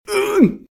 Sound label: hurt; RadwinIsland